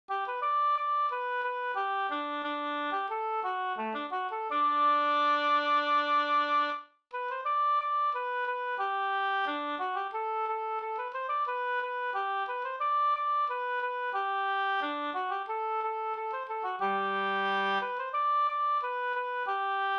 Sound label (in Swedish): folkdans, Kadrilj; fr Sorbyn Nb